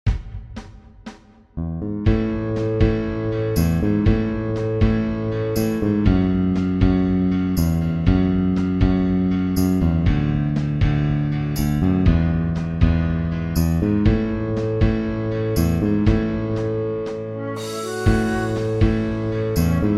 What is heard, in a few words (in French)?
ADULTES SOLISTES